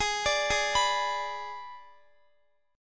G sharp Diminished G sharp, Guitar; G#dim Chord

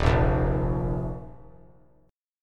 Eb Suspended 2nd; Ebsus2 strummed; JGuitar

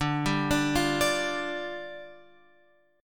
D Minor Double Flat 5th

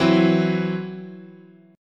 E Suspended 2nd Sharp 5th, chord

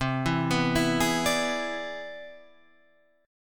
C Augmented Major 9th, Guitar, Half Step Down

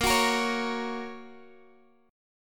Bb Minor Sharp 5th, Guitar, Open D; 20,21,20,19,x,x

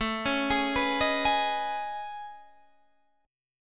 A Major Sharp 11th, Guitar, Half Step Down

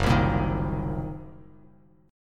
Gb 6th Flat 5th, Bass Standard; strummed; Gb6b5; 2,3,8,8; JGuitar

